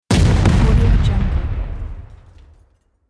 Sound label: double explosion